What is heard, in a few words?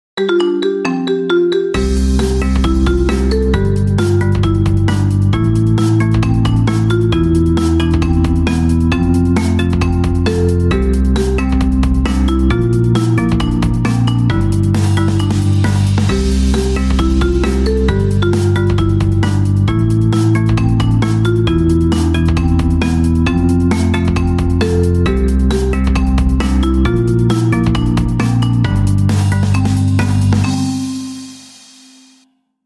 Marimba; Dragon Ball GT; 아이폰; 벨소리